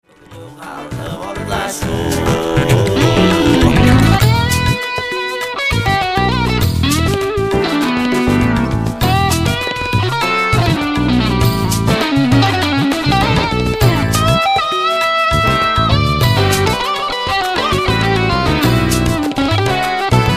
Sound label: Homyr E Guitar; Kaldak